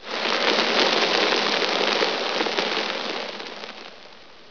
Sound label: sandfall, ambience